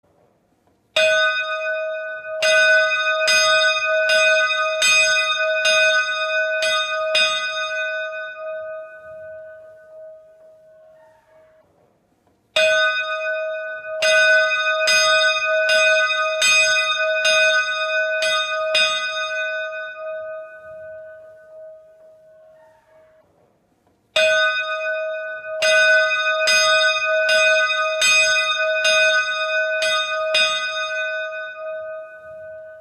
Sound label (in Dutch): kloosterklokken; spatiumspiritus